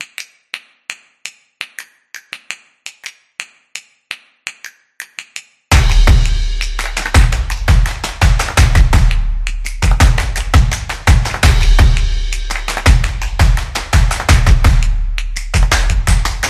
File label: This Is Drumming Beat; sports beat